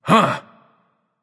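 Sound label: nonverbal surprised; smite; voicepack; merlin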